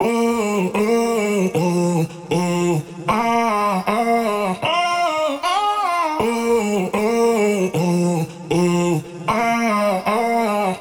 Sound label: 155 BPM A# Min Lead Vocal; Melodies; Loop Stems; Cymatics; Celsius; PHARAOH Beta Pack; Pharaoh Premium Drum Samples (BETA)